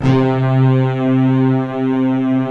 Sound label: SYNTH ORCH